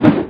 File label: attack axe